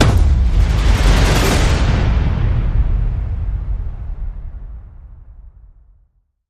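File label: crescendo; Initial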